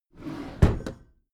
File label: packed drawer smooth close regular halfway